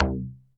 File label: TABULO LO; Northstar; The Wizard VOL-1